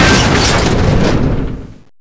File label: break door Heavy